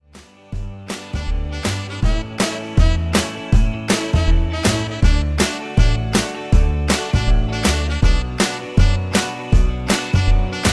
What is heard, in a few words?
DJ Intro Outro ReDrum 80bpm